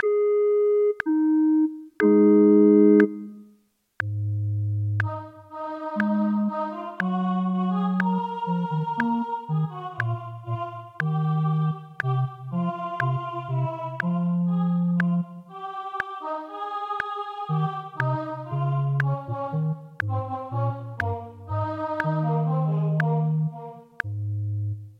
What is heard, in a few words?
O clap your hands; Gibbons